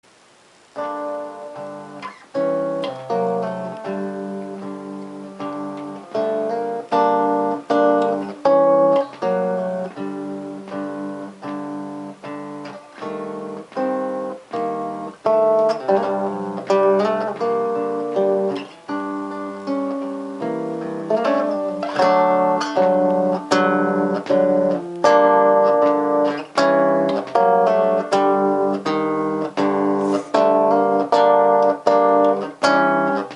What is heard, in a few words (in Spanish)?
Guitara